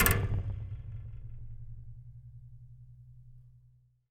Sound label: Colide